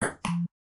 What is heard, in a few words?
Ping Pong, notifications; Samsung Galaxy S26 Ultra; Fun